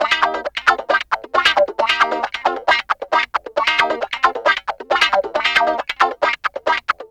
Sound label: GUITARS 135